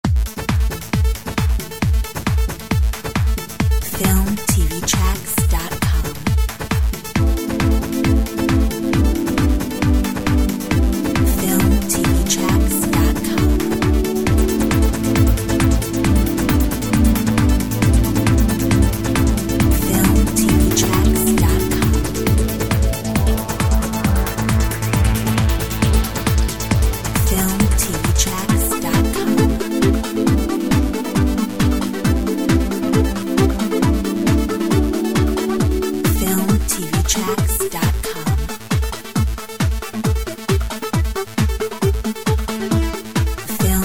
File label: techno